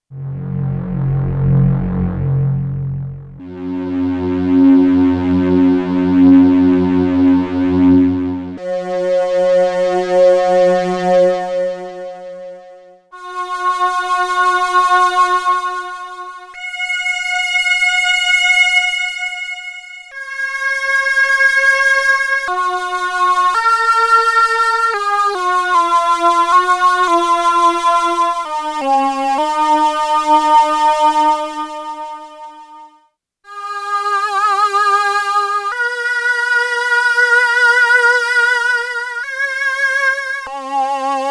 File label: pmod voices, p600